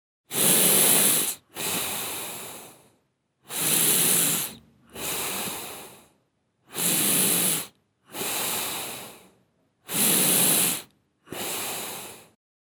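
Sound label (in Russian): звук дыхания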